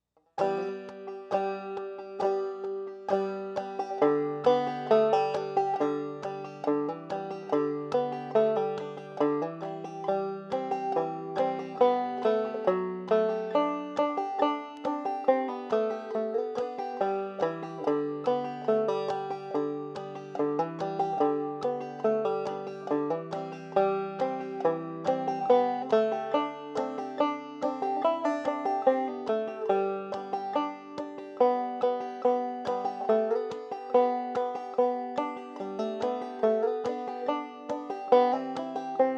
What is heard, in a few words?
jingle easy slow, banjo; Christmas